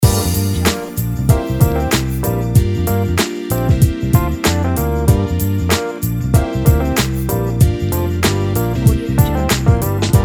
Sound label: funky hip hop groove loop